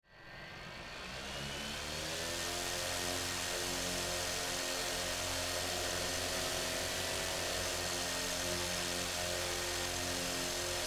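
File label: sounds of quadcopter drone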